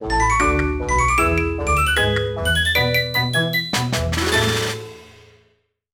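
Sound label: Music Win; Tales to Enjoy Little Red Riding Hood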